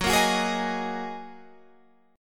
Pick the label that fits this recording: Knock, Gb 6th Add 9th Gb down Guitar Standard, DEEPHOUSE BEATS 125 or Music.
Gb 6th Add 9th Gb down Guitar Standard